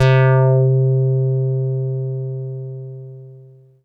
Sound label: Booty c4, Korg Poly-800